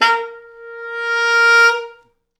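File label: tenor sax, A#3; East West; Quantum Leap Horns Sax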